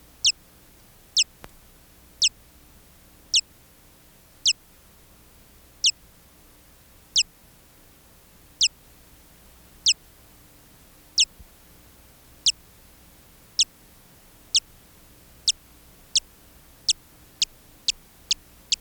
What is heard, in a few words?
bat